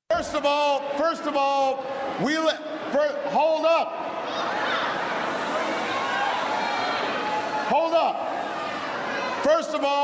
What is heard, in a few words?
quiets crowd; obama